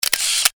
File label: camera single shot